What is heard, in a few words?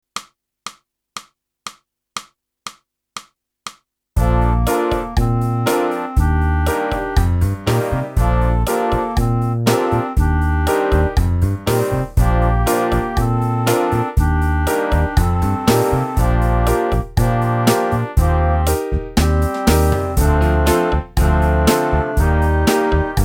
sax